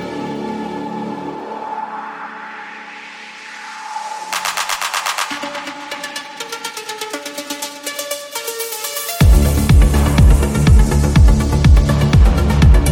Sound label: Motorola Moto G7 Play Ringtone